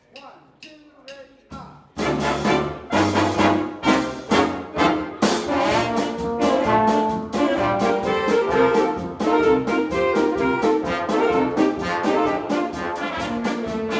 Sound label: Polka